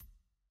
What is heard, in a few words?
grid drag